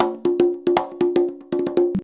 CONGA 119

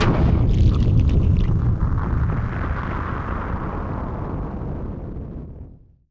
Firework FX; musicradar; bomb factory

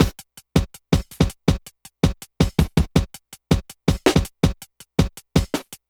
Tek, Drums & Percussion, Electronica; Sonic Foundry (Sony Creative Software) - Electronic Point Blank

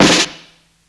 snare roll, Psychedelic Drums

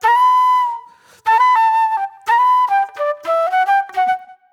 Agro Fusion Flute

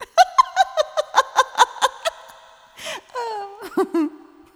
laugh

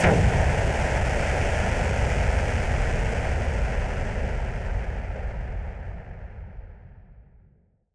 Noise Bomb Boom Filter; musicradar; bomb factory

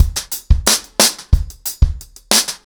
90BPM, acoustic drums, drumloops; The Roots Drumloops

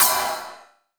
Perc, Gated Reverb; musicradar

